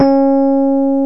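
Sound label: electric piano F#3; East West; Global Sounds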